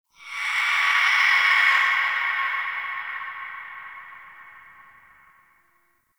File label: Ext Creature Distant Mid; Warframe; Infested Eris